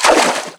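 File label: water wade; midnight guns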